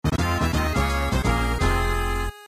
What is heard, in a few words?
Bonus Lose; donkey kong country 4 the kongs return demo 1 windows 2012